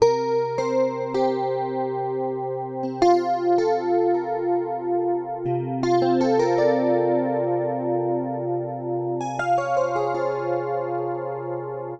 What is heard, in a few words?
Dreamy Guitar Pattern